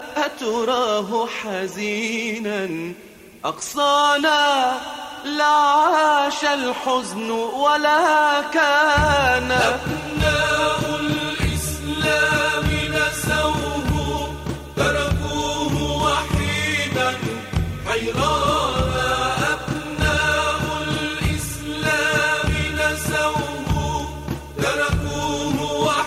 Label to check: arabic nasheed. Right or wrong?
Right